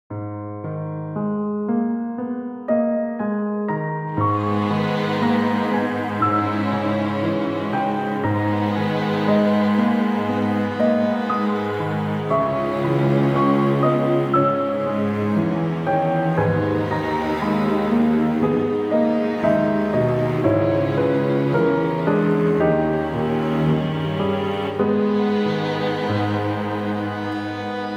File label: music theme piano strings